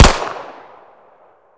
glock clipin; cstrike; ImbrokeRU

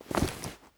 glock clipout; cstrike; ImbrokeRU

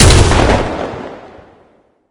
awp, weapons; cstrike

